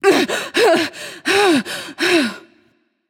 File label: breath; smite; nuwa